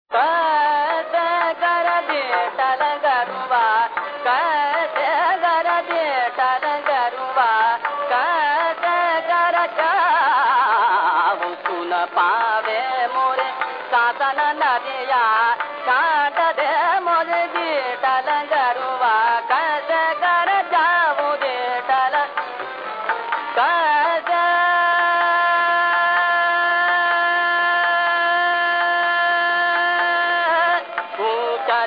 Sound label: hamir